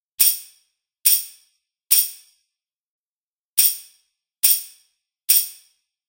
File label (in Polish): tamburyn; Nagrania Lokomotywy; edukacja wczesnoszkolna